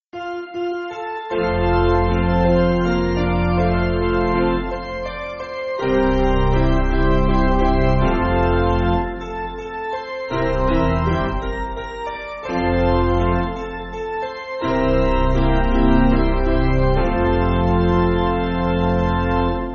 Piano

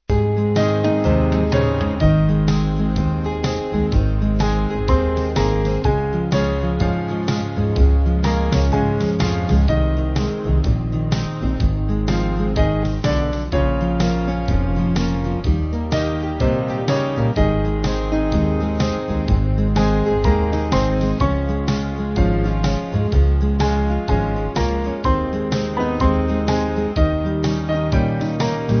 Band

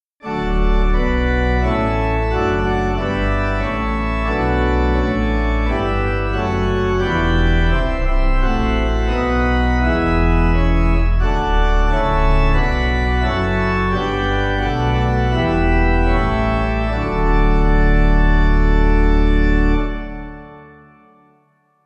Kyrie Pipe; Willan

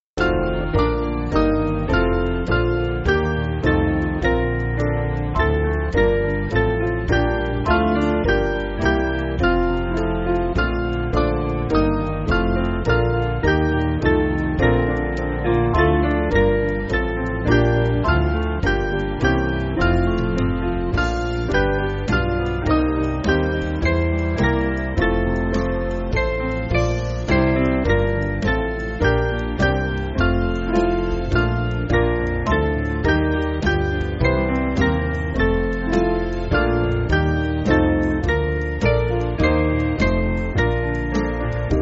Band, Backing Files for Churches; Praise Be To Christ In Whom

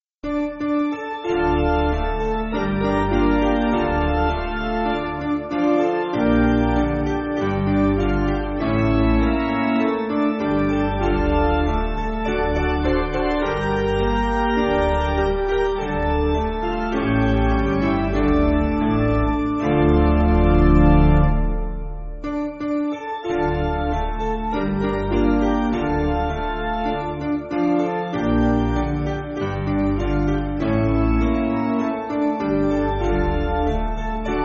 Hymnary Piano; Basic Piano & Organ (CM)

